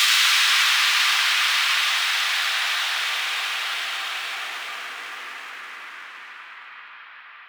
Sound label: White Noise Down; House; FX; Crunch White Noise Down